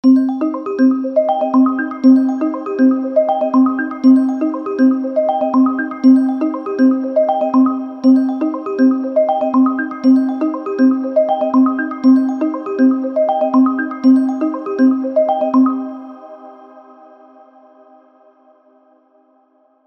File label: Arp 16th Flux